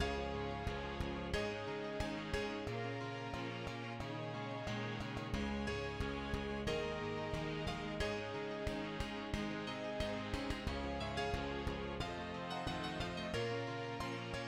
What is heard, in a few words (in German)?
Band Gesang